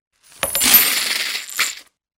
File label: Coin sfx